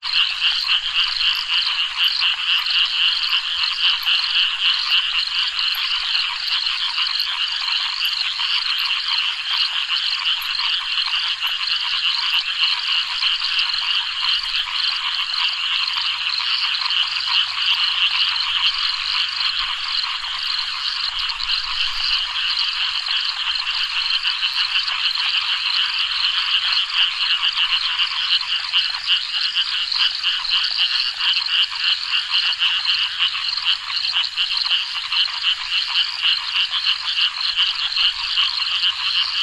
frogs toads many insects reservoir night subtropical australia